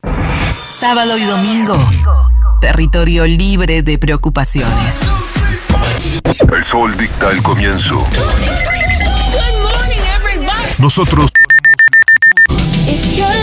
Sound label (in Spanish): Arte Ambient MIX Otono